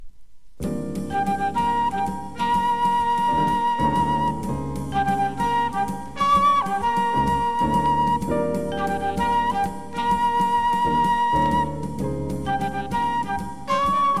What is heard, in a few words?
flute